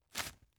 tissue take

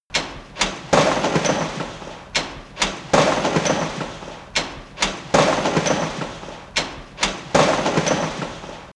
industry machine mechanic metal complex

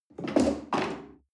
chair wood take slide hard

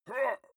male shout hit soft

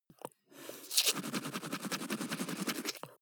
teeth brush dry closed front fast

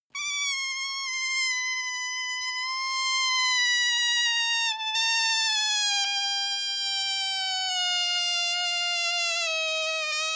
balloon air release squeak long

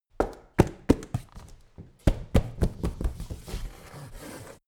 recycle bin hit

